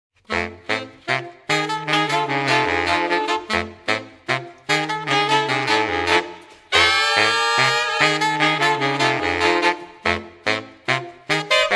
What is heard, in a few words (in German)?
Kling Glockchen